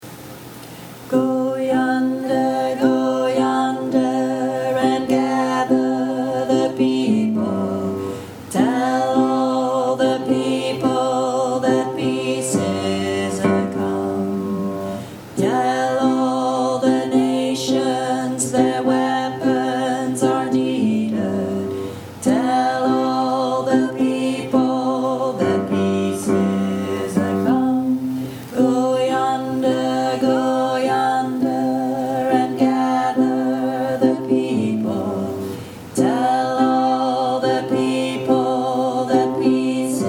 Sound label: Peace Is A Come baritone